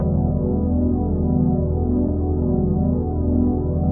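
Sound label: 122 BPM Pads D major B minor Tonic E, ambient synth pads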